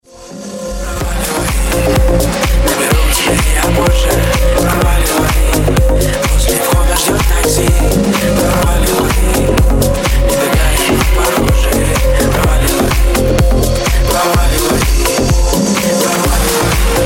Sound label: funky radio remix